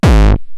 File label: Roland TR-909 BD; BD Selection